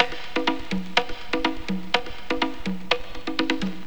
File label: bongo wow; Drum loops